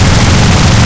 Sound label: shkas loop, guns